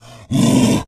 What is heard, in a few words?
zombie brute run; smite; npc; roman archer